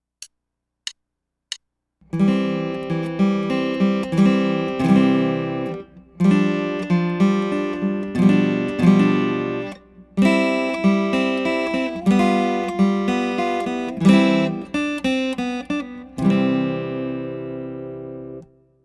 Running In The Chord

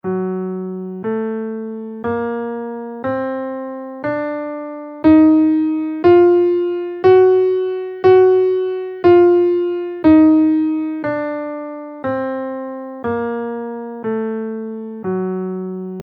lydian Augmented 2 scale in F

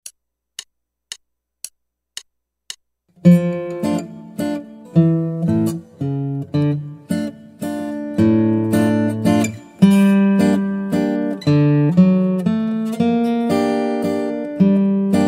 Guitar

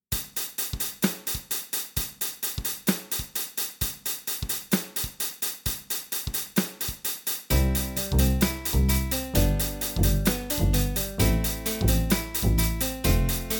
3 vs. 2 backing

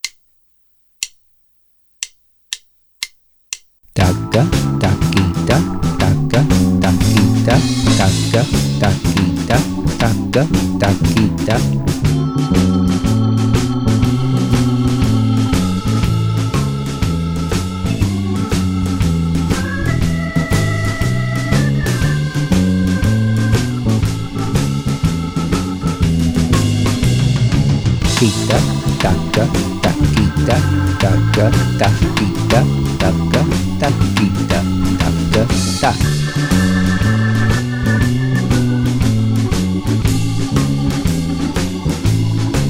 12 claves on blues

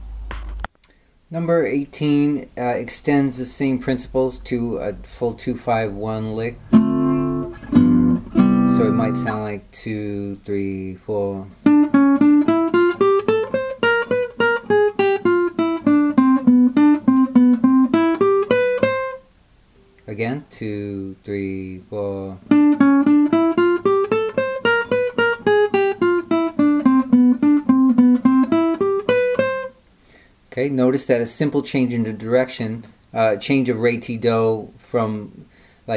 jazz licks

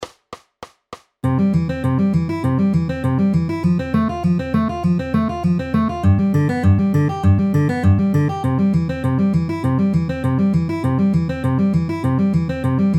C F G C inner outer middle ring at 200 bpm

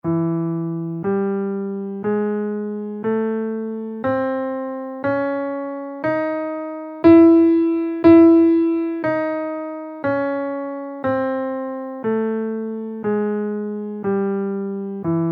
ionian 2 5 scale in E

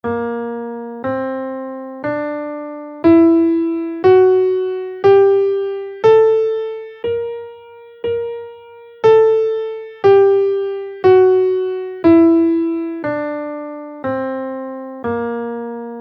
lydian augmented scale in Bb, guitar

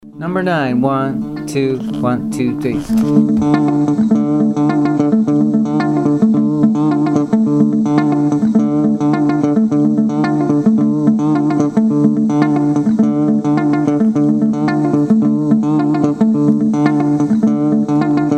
mozambique patterns

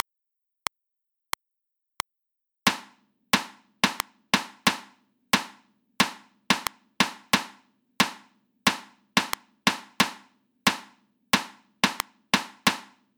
rhythms 16ths at 90 bpm

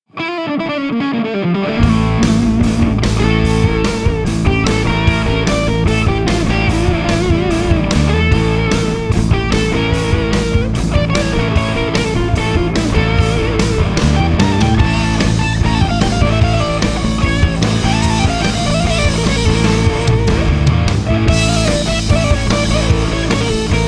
phrygian dominant, guitar

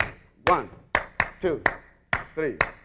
subdivision of 3 starting on third partial loop

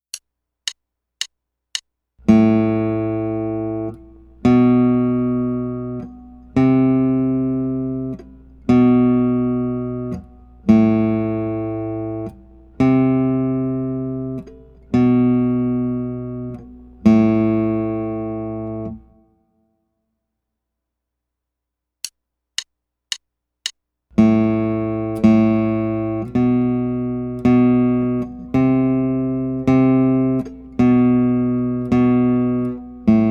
A Notes Whole Half Quarter

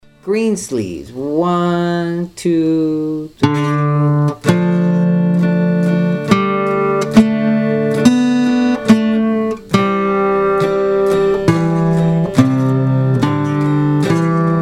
reading play along greensleeves; hal leonard

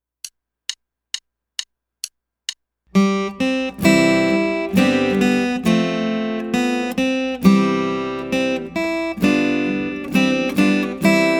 Two Note Pick Up

